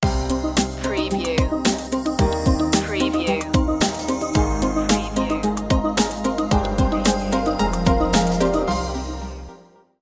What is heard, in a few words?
computer network sting